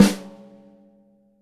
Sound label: snare drum; Univers Sons; Jazzistic